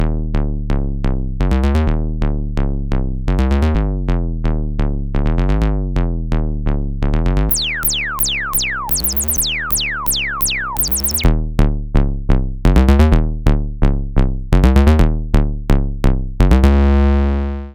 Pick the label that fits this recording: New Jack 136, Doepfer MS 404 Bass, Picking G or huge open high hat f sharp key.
Doepfer MS 404 Bass